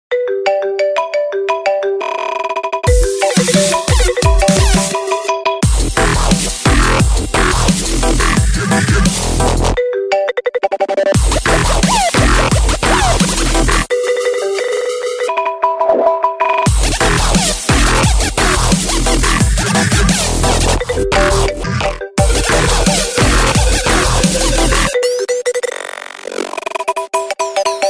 apple mix

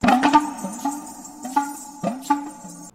drums; black panther

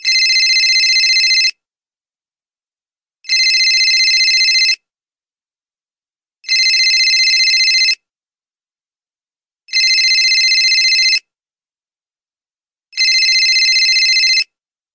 simple ring

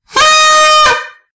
happy new year horn

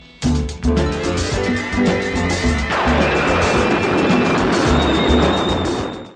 spin; wonder woman

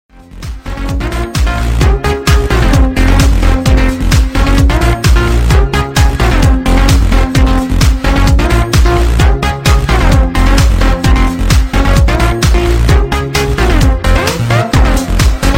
electronic mix

